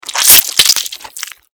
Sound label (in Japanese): クリーチャーが食べる音